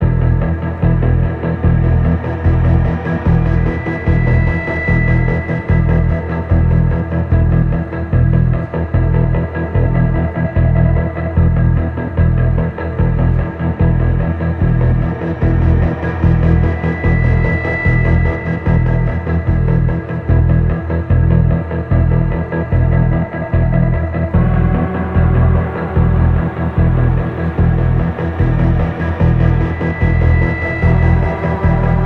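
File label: Synth loop; Call of Duty Modern Warfare 3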